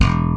PULL E1, Bass; Sampleheads; Will Lee Bass Library; SPECTOR PULL

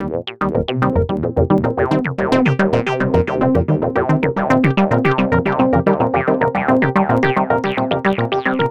303 loops; Sonic Foundry (Sony Creative Software); Ugly Remnants VOL-2

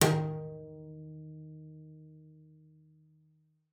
D#3, bass pizz; Xsample VOL-5

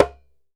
DJEMBE, African Drum

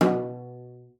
CELLO FINGER B2; Xsample VOL-5

